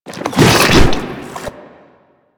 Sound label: Infested Ancient Strike Flesh; Warframe